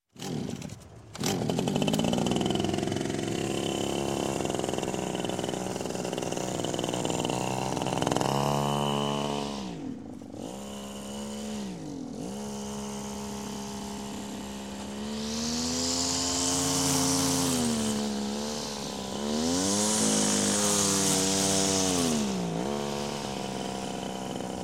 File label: Lawn Trimmer Gas Start, Run, Gardening, Clipper; Sound Ideas 2000 Series; Clippers